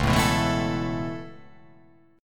C sharp Minor 7th Sharp 5th C sharp

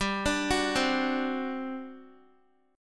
G Major 7th Suspended 4th, G, down, Guitar; GM7sus4; arpeggiated

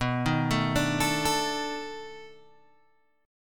B 7th Sharp 9th, down, Guitar, Open G

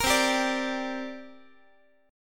C 7th Suspended 2nd, Guitar; C7sus2 strummed; JGuitar

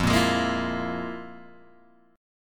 F Minor Major 7th Double Flat 5th, Guitar, Open G

